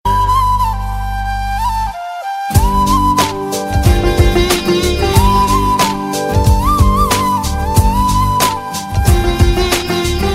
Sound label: PERFECT FLUTE RINGTONE